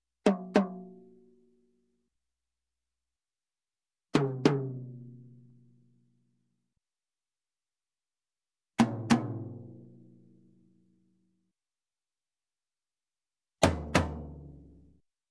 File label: Drums, Tom Tom double Hit, Music, Percussion; Sound Ideas; Series 2000